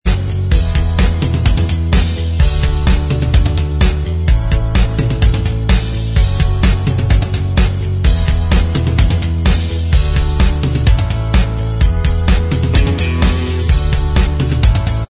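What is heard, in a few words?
Instrumental Track, backing tracks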